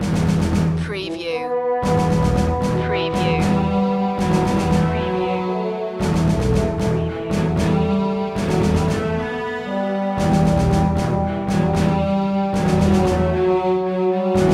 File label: conflict loop